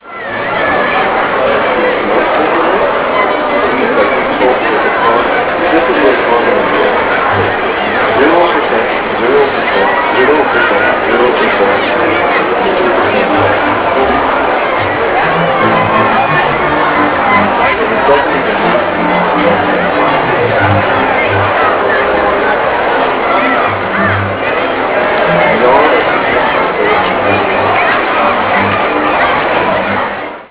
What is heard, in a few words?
crowd